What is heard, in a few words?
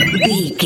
collect item